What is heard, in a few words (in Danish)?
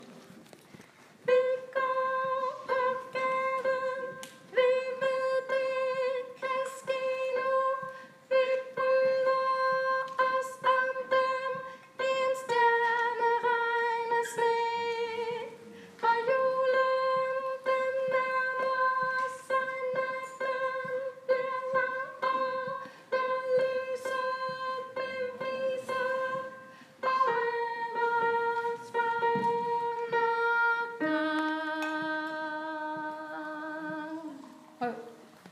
i en stjerneregn omkvæd overstemme sopran